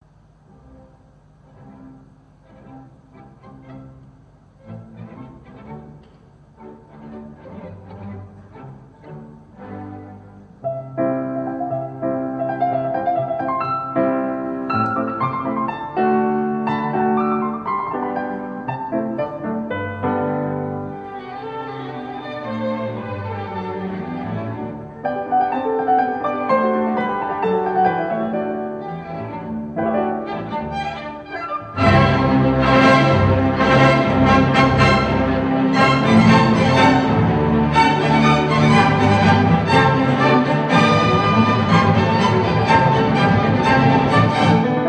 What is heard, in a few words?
Piano Concerto; Beethoven Piano Concerto No.4 in G major Op.58 mov. III